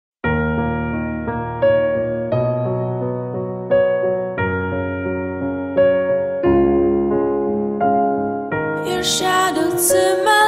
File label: Slow Waltz remix